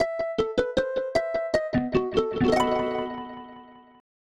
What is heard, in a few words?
Silver Harp; Dragon Quest III